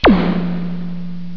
LASER

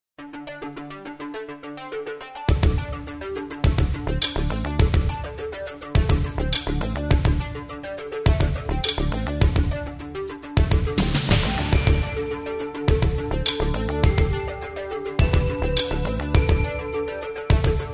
Filler Rhythm; Toolbox; DennisMusicLibrary

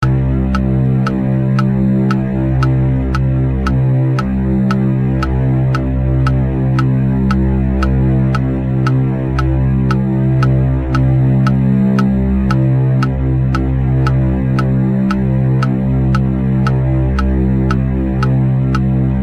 tension; Rosegarden

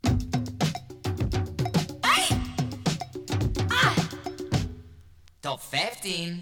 jinglepackage; topformat LP jinglepackage 1

